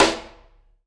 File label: Piccolo D, drum